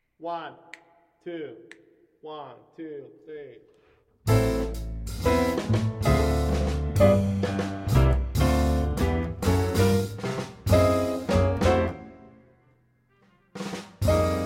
minus horns; rhythm section only; so you can play it in a quartet setting; You are the only soloist—four solo choruses

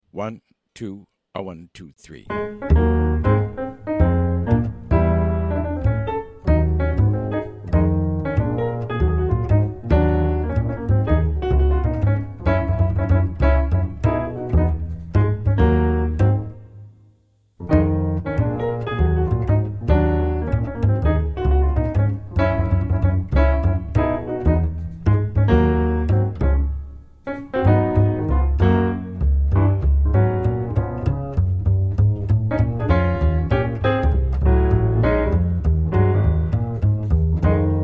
minus Drums; sticks throughout, snaps have been added for the trading sections; count off sets up the intro

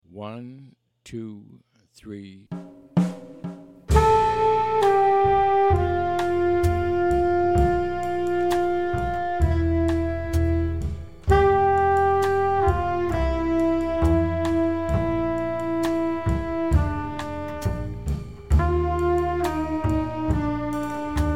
minus Piano

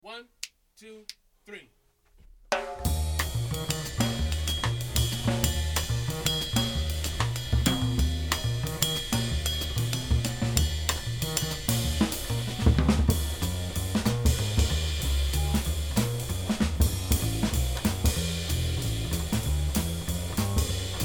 bass drums only

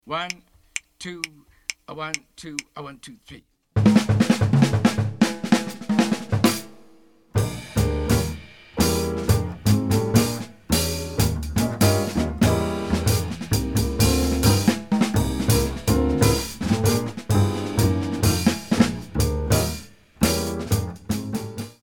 uptempo minus Melody

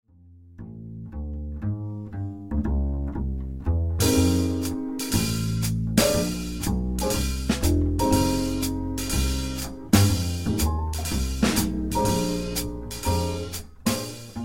minus MELODY; this version comes from a separate quartet track; tacet for solo bass intro 1 chorus; play the melody 2 choruses

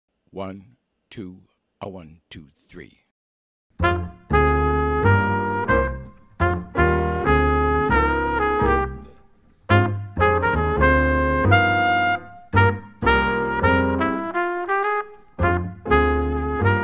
medium minus Drums; count off sets up the melody; play figures/comp for the melody twice and coda

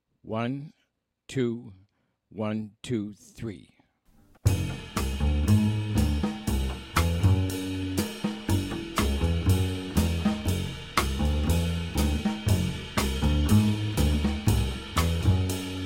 minus Piano; count off sets up the intro; play figures/comp for the intro and melody; comp for the alto sax and trumpet solos

